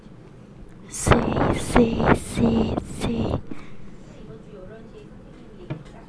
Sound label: sea